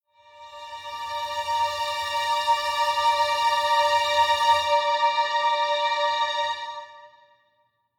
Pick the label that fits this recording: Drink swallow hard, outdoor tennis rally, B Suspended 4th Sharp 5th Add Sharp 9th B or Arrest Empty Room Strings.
Arrest Empty Room Strings